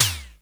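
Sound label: electronic drums; Crypton - DrumBase5000; ELEC.DRUMS#3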